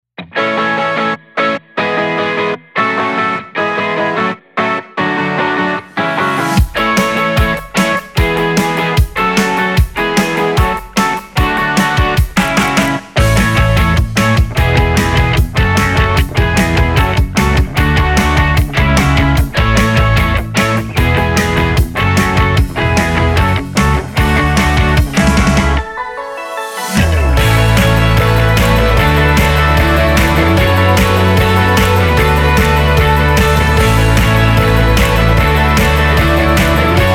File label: Indie Pop Electro Rock